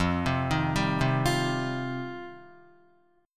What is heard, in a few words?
F 7th Suspended 2nd Guitar, chord; arpeggiated